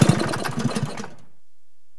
go stop, gokart; vehicles; sligwolf

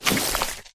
human form short move; flood

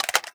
m21 mag out, weapons

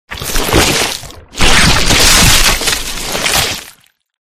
human flood morph arrival

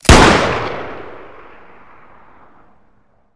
raging bull fire

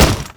bar shoot, weapons

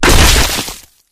bodyfall combat form; flood

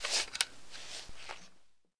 generic cloth fast, weapons handling

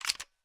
m3s90 load

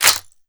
g36c boltforward; weapons

